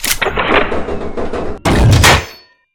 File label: phase shift